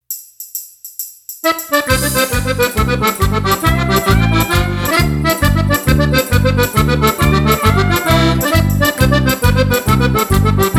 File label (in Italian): FISARMONICA ALLEGRA, mazurka mazurca 46 BPM ballo liscio da sala balera; settebello